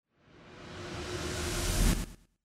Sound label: Transition